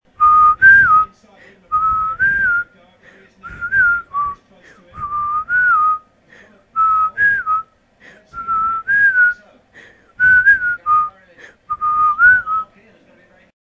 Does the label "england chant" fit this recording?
Yes